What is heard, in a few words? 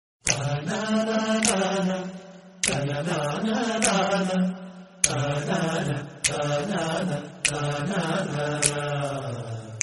instrumental song